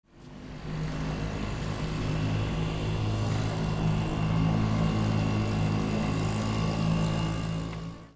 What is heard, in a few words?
BOATS